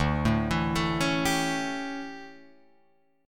D Major 7th Suspended 2nd Suspended 4th D down Guitar Drop D